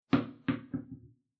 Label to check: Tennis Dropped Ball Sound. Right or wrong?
Right